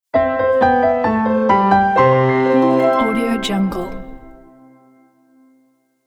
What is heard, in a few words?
magic piano logo